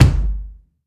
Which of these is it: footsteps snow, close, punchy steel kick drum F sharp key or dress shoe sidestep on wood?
punchy steel kick drum F sharp key